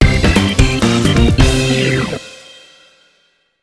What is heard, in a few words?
Jingle Victory